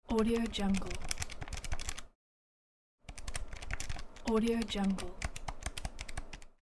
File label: calculator; mrsoundfx